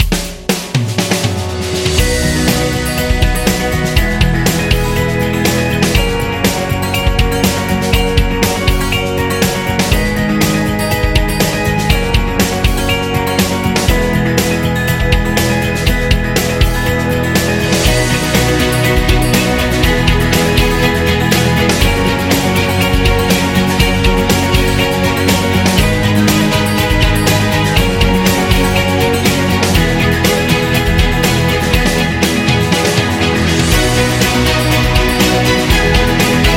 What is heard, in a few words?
Medical Background